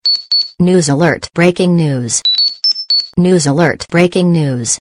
breaking news alert; ringtones; sms

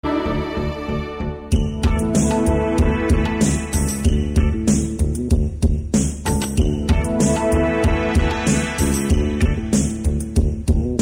slow instrumental

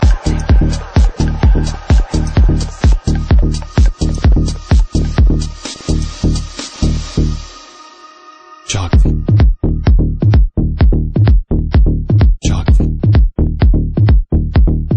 minimal, ringtones electronic

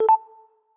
Rhodes

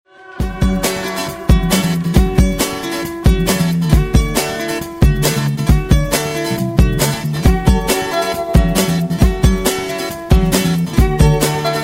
Fast Melody

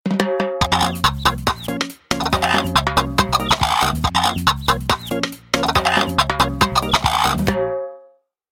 3d chicken sound; animals

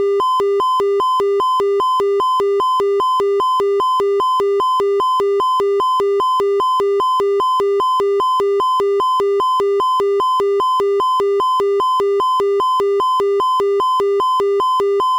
iphone4s aster alarm; ringtones; sms

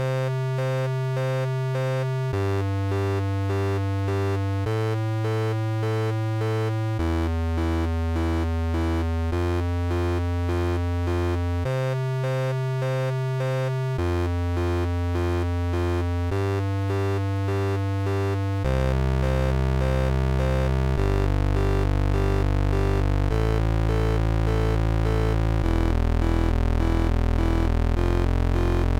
Alert

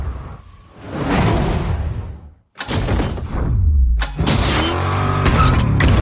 Car Bounce message

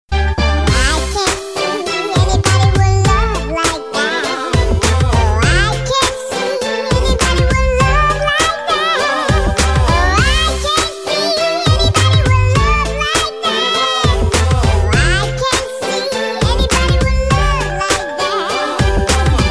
baby singin lullaby

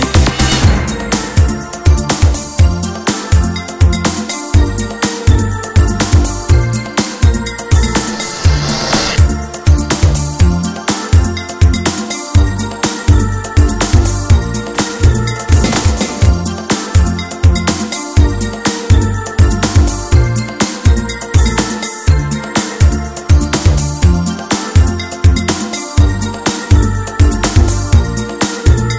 90s New Wave

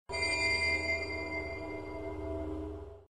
Bell

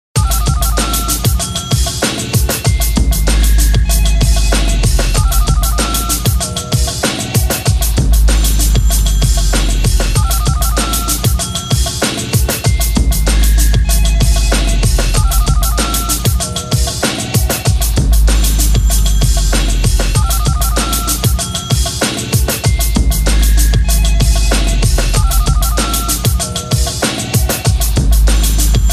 remix phone, dance

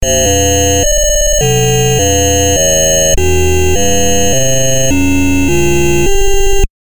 Dialup Remix

